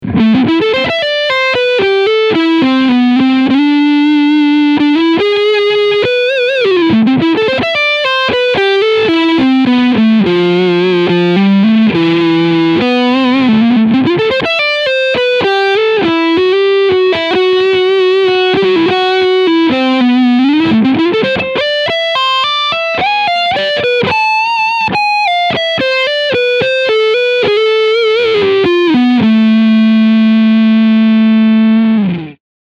Ibanez AZ2204 Lead Sound Front Singlecoil Alter Switch